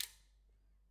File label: Back Pedal, Bicycle